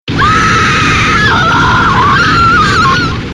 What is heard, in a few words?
Woman Screaming